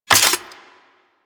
Sword Board Equip; Warframe; Tenno

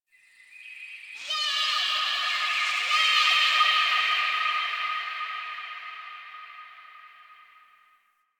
Park Memory Echoes

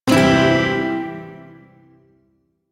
Shawzin Maj Chord A; Warframe; Duviri Shawzin

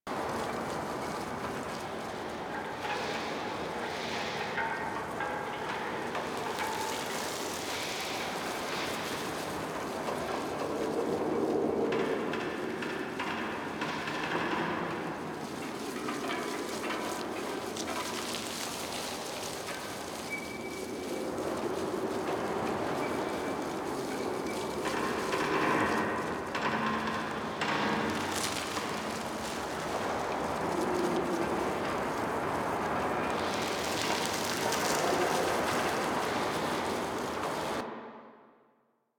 Rock Cove Interior Loop; Warframe; Quests; TheNewWar; Ambience; Drifter Camp

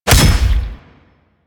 Ghoul Devourer Body Impact; Warframe; Grineer Ghouls

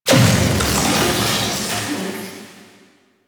Keyglyph Activation Electric Hazard; Warframe; Entrati; Vaults

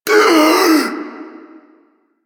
Friendly Grineer Revive Complete Gasp; Warframe; Veilbreaker; Quests; Kahl

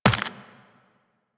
Run Flesh, Footsteps; Warframe; Tenno

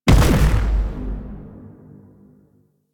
Railjack EMP Stun Ability; Warframe; DE; Vehicles; RailJackPowers

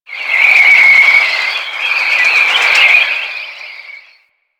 Mountain Pass Wind Gust Whispy; Warframe; Ambience; The War Within

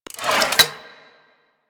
Shotgun Fire Stop Mech D; Weapons; Warframe; Tenno; Alchemist

